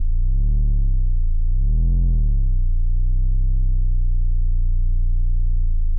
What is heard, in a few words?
low tone; fvtt sonniss gdc audio